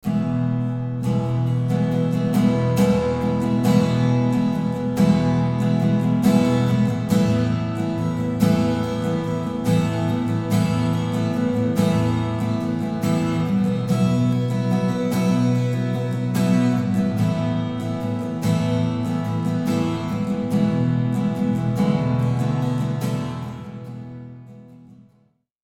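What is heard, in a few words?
long reverb time; audio examples from the book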